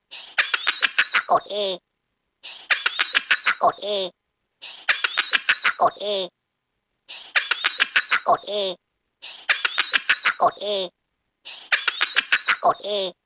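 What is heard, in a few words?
leprechaun laugh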